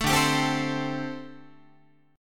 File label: D 7th Suspended 4th, down, Guitar; D7sus4; strummed